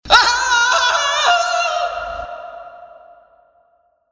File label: Scream II